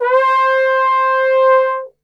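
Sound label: C 4 trombone glissando, Brass Super Section; Best Service; TRMB GLISS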